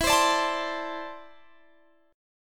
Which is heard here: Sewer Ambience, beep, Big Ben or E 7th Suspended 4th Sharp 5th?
E 7th Suspended 4th Sharp 5th